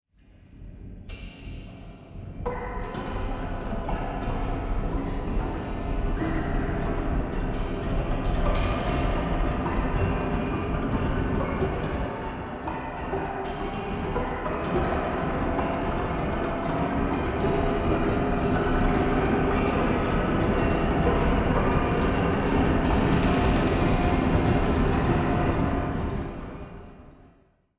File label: Mechanical Whispers